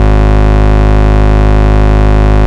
Root G#, Bass; Vengeance Samples

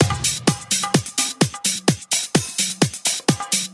House Loop, 128 BPM; Vengeance Samples

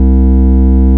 Ultimate Bass Root C; Vengeance Samples

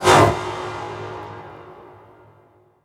Tonal FX G#

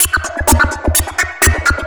Electro Loop 128BPM; Vengeance Samples

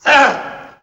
Ambiences, Places and Ambience; Vengeance Samples; FX Vocals

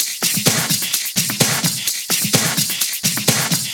Shaker Loop, 128 BPM; Vengeance Samples

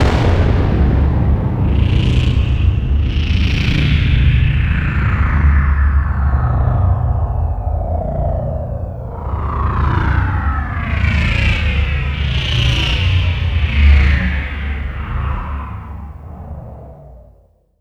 Hits & Explode

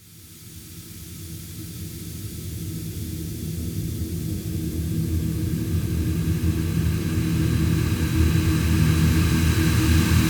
140BPM D#Minors 8Bars, FX Fills; Vengeance Samples

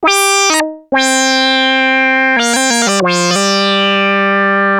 ARP Odyssey, Arp A Line, synth samples

